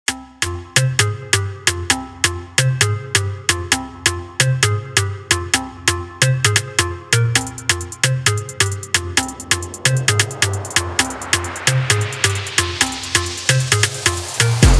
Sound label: Drill Vox